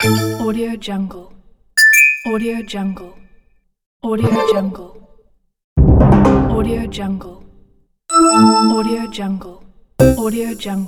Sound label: android menu sfx